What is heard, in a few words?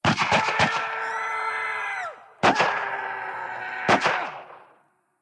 idle human; cstrike